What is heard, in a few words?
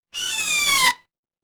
cartoon squeak